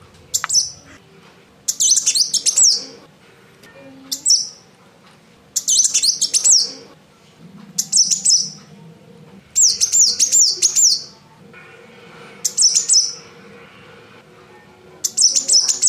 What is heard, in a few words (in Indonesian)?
kolibri wulung betina